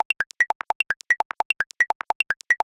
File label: diode, ringtones; Nothing Phone (4a)